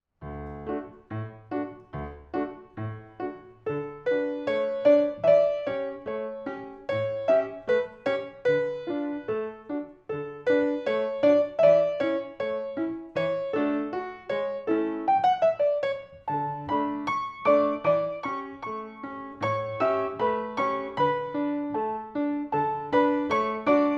Swan Lake Act 1 Pas de Trois 1st female variation slowed approx 76bpm; INSPIRE Biennial event and awards